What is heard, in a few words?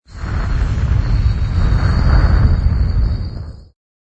Flame Burst, Short synthtic flame burst sound; Furnaces; Household